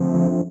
Fm Dub Chord

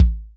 BD DRY, Yamaha DTXpress Acoustic Kick

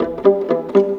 Viola Pizz, Cinematic; Sonic Foundry (Sony Creative Software); Orchestral Series VOL-3

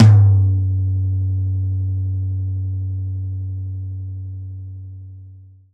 Dry Tom Lo, Roland JV-2080

